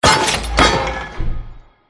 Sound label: City Blacksmith